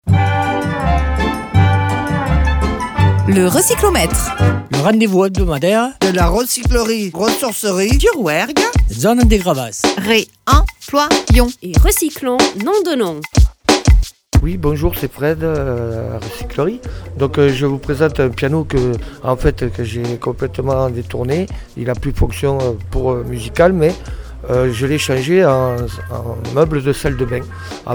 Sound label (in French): Piano meuble; RECYCLO